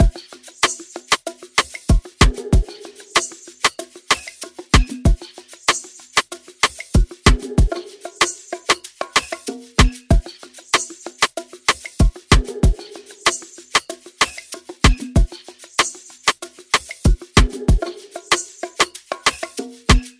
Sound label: 95 bpm modern afrobeat drumloop; bandama